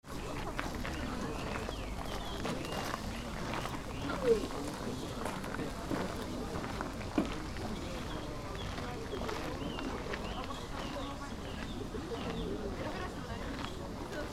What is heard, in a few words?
parking, Parking area in front of Gokoku Shrine; Mt. Shinobu: 10 April, 2025; The cherry blossoms in Mt. Shinobu were fully bloomed